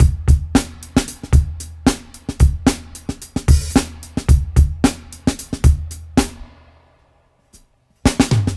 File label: Funk 112 bpm, drums; Loops; Ableton Live 12 Lite; Core Library